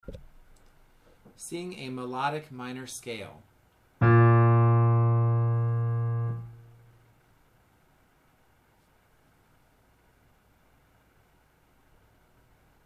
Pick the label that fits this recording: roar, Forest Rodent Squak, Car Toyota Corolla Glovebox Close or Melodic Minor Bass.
Melodic Minor Bass